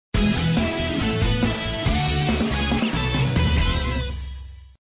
Level Completed; Uphill Rush; flash

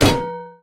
Extension Ladder Metal Slam; Lethal Company; Windows

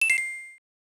Special Chime; miracle girls 1993 snes

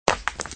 stone break; tempala

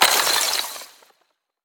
Shatter Sweetener; Boomerang Fu

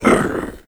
Troll Hit; The Battle for Wesnoth

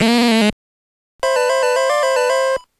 Game Over BGM; Tetris Gameboy Theme

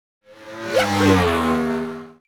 Startup; Mario Kart DS Deluxe; NDS

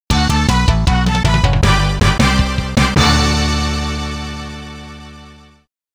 Subgame Winner Fanfare; Ganbare Goemon Sound Treasure Box

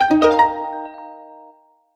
Jingle Promotion; clubhouse games 51 worldwide classics 2020 switch gamerip

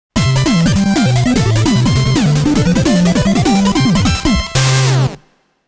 Stage Clear, soundtracks; 8bit Kira Kira Star Night DX; Riki

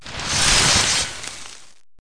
mission pods Spikes

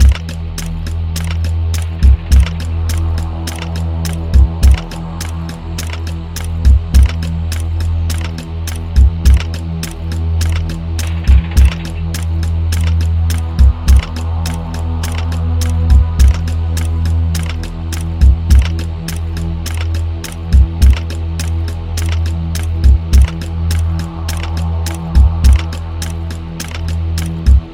Dead suspense

